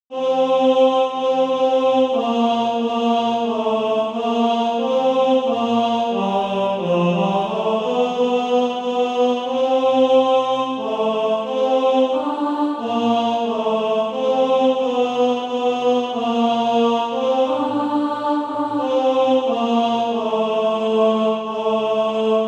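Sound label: tenor, SATB Practise Music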